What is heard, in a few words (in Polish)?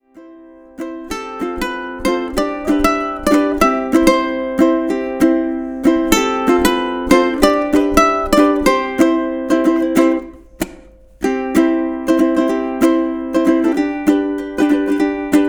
wersja instrumentalna ukulele; bez linii